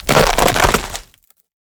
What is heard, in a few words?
wood crack; Bluezone Corporation